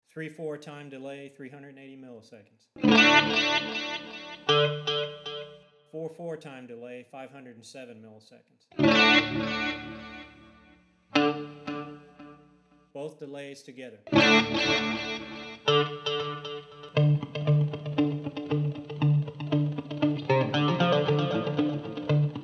Setting Two Echo Delays stereo

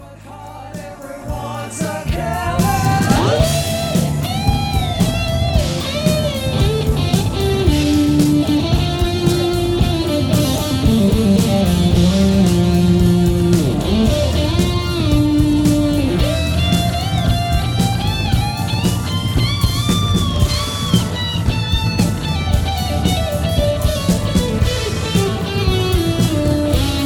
solo

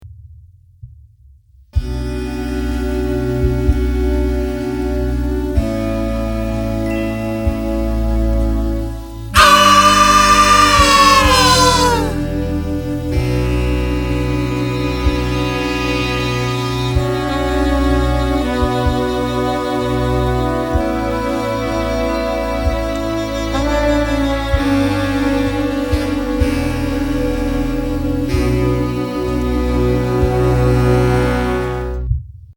Orchesra and scream

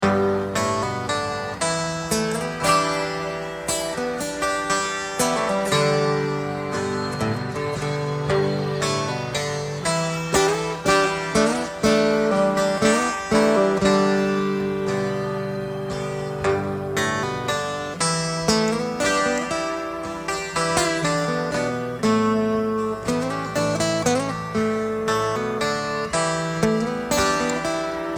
Isolated Guitar; Nassau 1988

